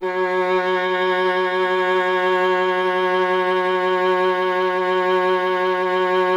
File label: STR VLA, Solo Instruments